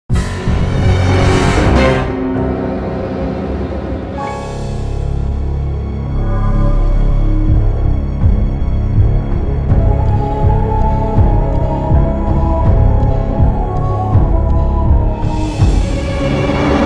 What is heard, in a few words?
Fight Start; music; Silas; The Da Vinci Code; XBOX